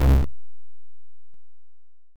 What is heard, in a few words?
Cancel; glitch in the system; godot 4